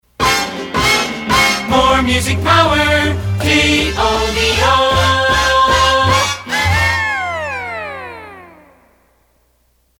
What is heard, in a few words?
Timpana; KOVO; PAMS